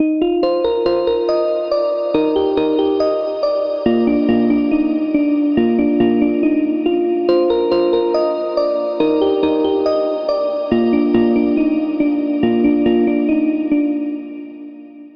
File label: Pluck; Routed Sound; Spire Expansion - Flame Summoner Vol.1